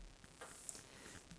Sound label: noise Zoom iQ7 with radios on